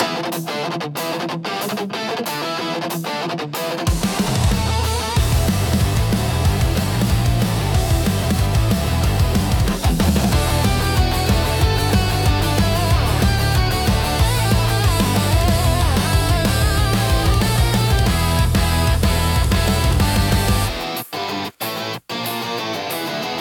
a fast rock intensity; victory engine; action; Unbreakable Motion

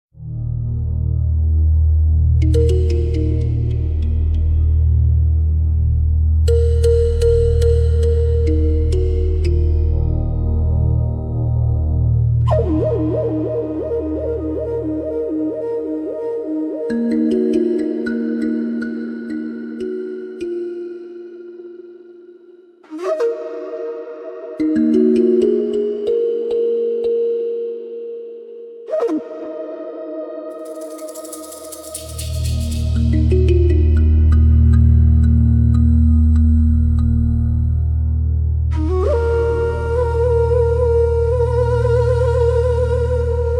a cinematic nature theme; jungle light reverie; jungle-ambience

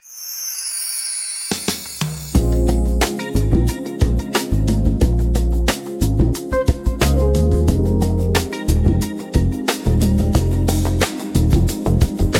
a gentle chill ambience; quiet motion

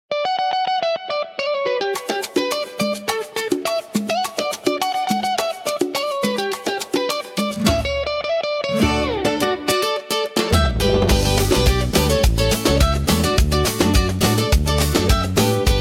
a light latin instrumental

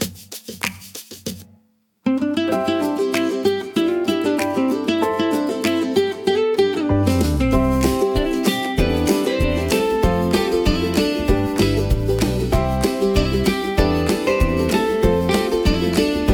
fresh air walk a soft joyful acoustic soundtrack

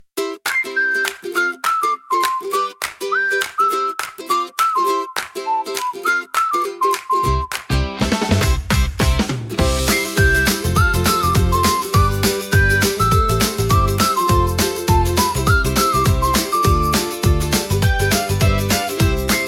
fresh breeze pop a joyful instrumental soundtrack; pop-and-happy

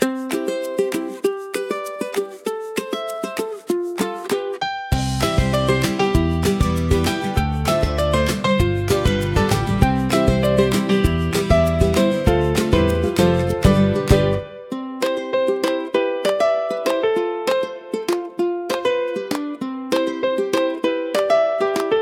light acoustic happiness soundtrack; little sunshine

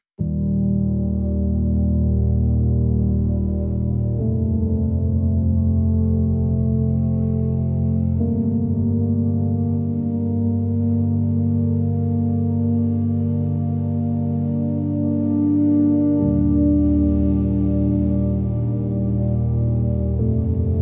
still waves, soft ambient scene soundtrack; Glass Horizon

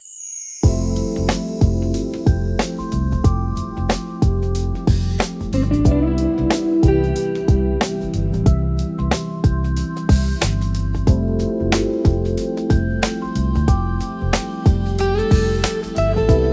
chillout relax flow soundtrack

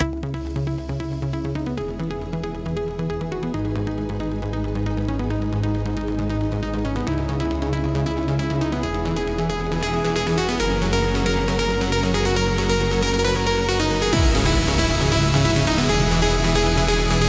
starlight pulse, melodic trance vibes soundtrack; eletronic; Neon Ascend

